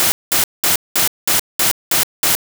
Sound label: W Noise; acer; veriton7100; INTEL